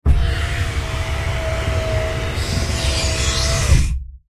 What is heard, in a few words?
Sci Fi Air Lock Door Seal